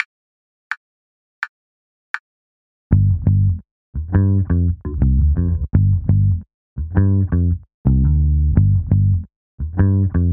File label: Groove